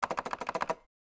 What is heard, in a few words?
typing